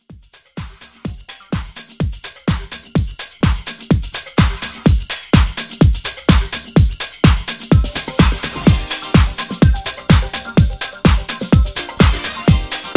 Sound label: 80s Re Drum 126